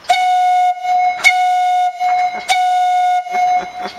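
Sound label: small whistle, foghorns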